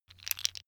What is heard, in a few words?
tiles wood